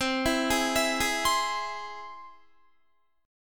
C Augmented, Guitar, chord; arpeggiated; 19,19,20,21,20,20

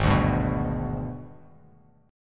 G sharp Minor Major 7th Flat 5th, Bass Standard; strummed; JGuitar

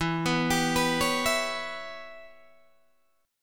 E Minor 6th guitar chord, Open D

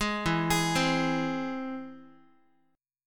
E Augmented, Guitar; E+ arpeggiated; JGuitar

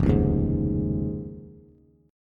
F Minor 7th Sharp 5th, Bass; JGuitar

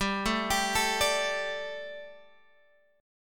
G Suspended 2nd G, down, Guitar, Open G

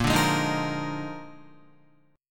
A sharp 11th, Guitar; strummed; JGuitar

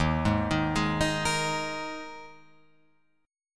D sharp Suspended 4th, Guitar, Open F; D#sus4 arpeggiated; JGuitar

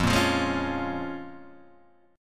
A sharp Minor 7th

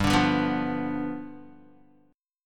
G 7th G, Guitar; G7 strummed; JGuitar

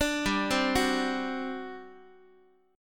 G Major 7th Suspended 4th G, Guitar; GM7sus4 arpeggiated

